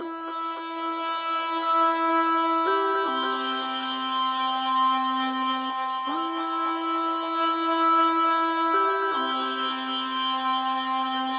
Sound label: 158 BPM A Min Lead, loop stems; Cymatics; 2022 Melody Collection; Wavy; Stamina